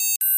electronic device blips

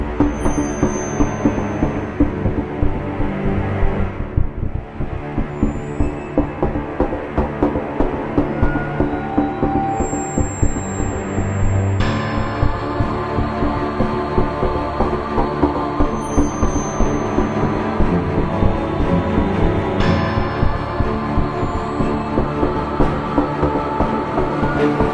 Dark Orchestrations

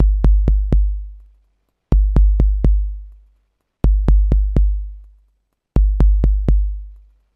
Ambient House Kick 125BPM

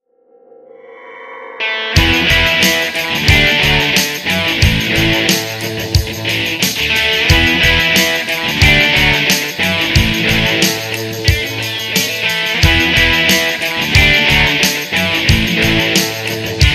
guitar rock instrumental

